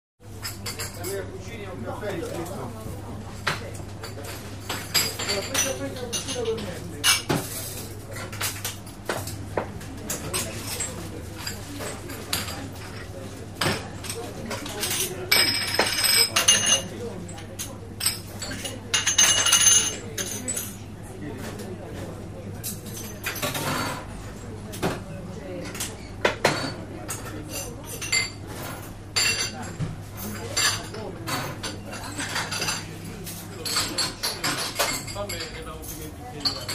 Rome Italy, restaurants

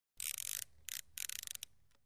Fishing Line Out

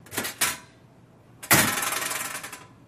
Locker Door Open, Close With Rattle; lockers

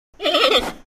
Panda Bleat Single

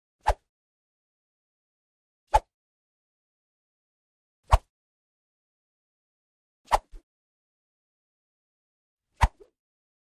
Swish Med Light 6x

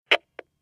Telephone hang - up, in-line; Futz Movement

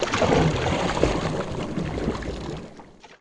Canoe Paddle Stroke Underwater

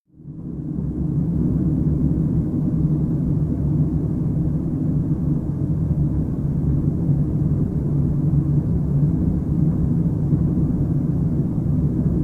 Surface Effect Play, drones

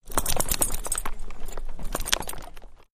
Carriage Horses, carriages

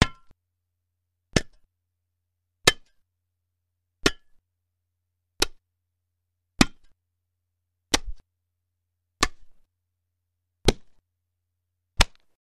Bone Impacts Large; gore stabs & eat

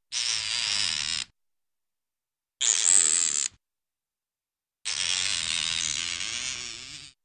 Fishing Reel Click; mechanical

